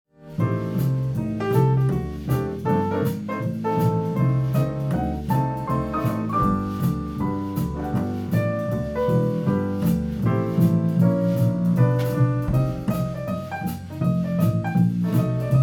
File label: PCM-D50, band uptempo; 441kHz16bit